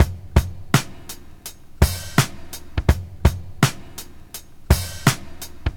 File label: 83 bpm 00s breakbeat f key